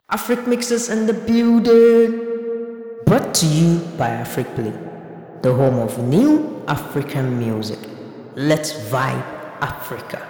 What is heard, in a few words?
Jingle